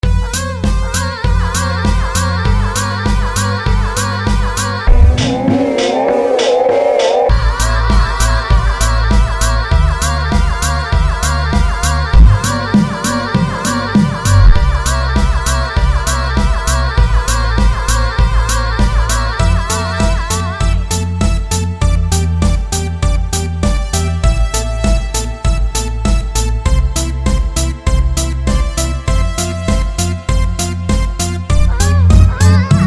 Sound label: instrumental sex remix